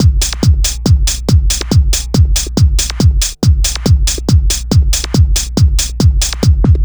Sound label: Dance Drumloop; Drums; Dance Beats